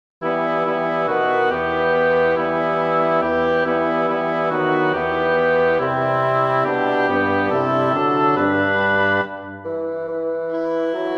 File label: for double reed quartet; bach johann sebastian; chorale christ der bist der helle tag